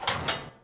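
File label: elevator door open; Mafie 1 cz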